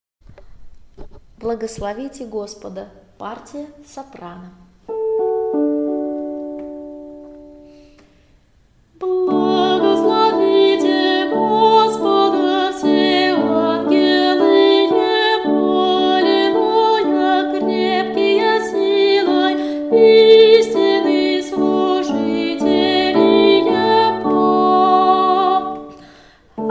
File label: soprano; Blagoslovite Gospoda